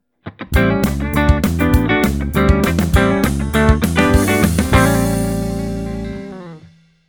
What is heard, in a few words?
pick